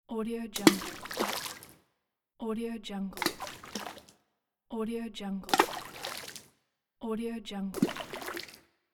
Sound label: water splash